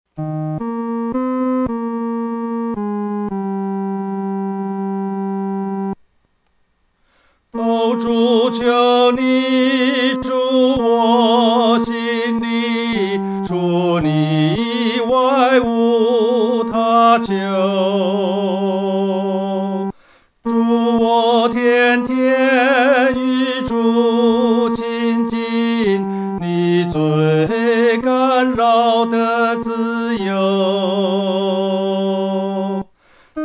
独唱 第三声

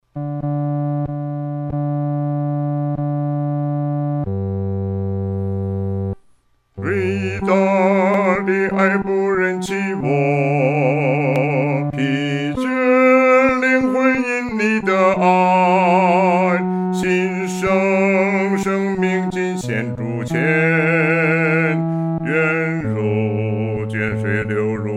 独唱 第四声

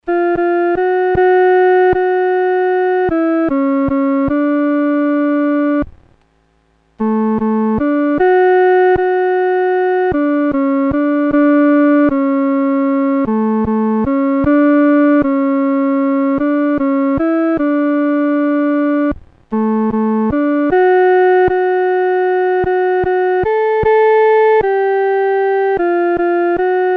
独奏 第二声

